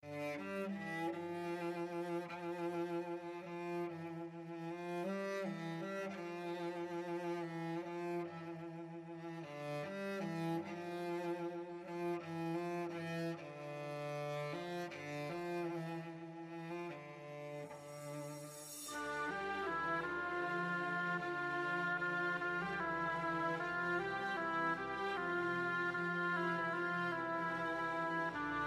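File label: VIOLIN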